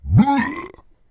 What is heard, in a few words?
Fat Pig Hurt; Angry Birds Space